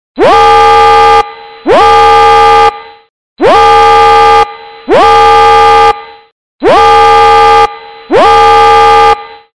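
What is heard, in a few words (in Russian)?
звук сирены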